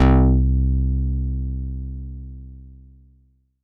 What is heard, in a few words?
Fatboy Relaxed c3, Korg Poly-800